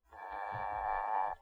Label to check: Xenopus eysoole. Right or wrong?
Right